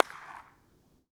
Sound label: Reverb in front of abondend hospital; SFX; IRL Recorded; Reverb Enviroments